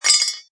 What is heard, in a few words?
Cartoon Metal Clanks, Metal impact, object drop rattle, clinki; Comedy; Funny Sounds